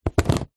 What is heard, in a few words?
household books small pile down on table